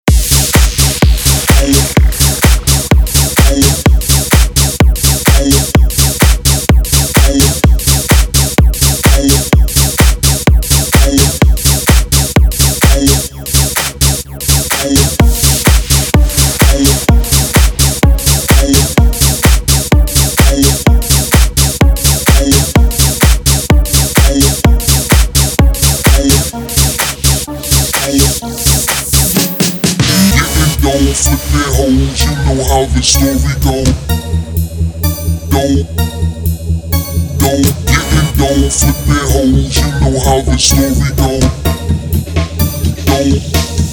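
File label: BASS CLUB car audio loud front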